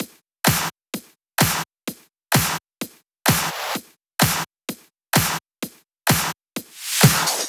128BPM Drums; Vengeance Samples; Celebration Kit

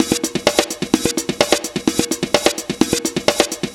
Percussive Loops 128BPM; Vengeance Samples

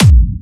Bassdrums Clubby Kicks; Vengeance Samples

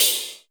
Ride, Cymbals; Vengeance Samples

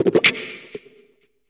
125 c, guitar licks; house; Vocal House; PS-28 Vocal House Samples; instrumentloops

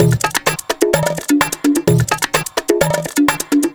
Latin House Loop 128 BPM; Vengeance Samples

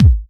Bassdrums

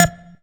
Synth Shot; Vengeance Samples

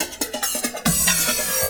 Loops Fillin140; Vengeance Samples; VEC1 Fills

